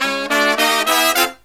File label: SLEEP, Killer Brass Riffs